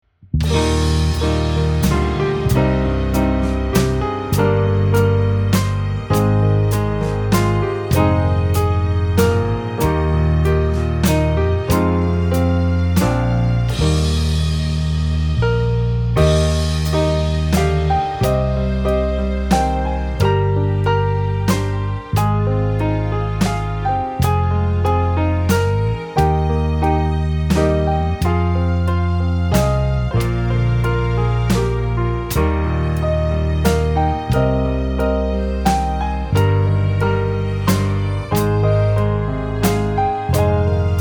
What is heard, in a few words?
Away In A Manger backing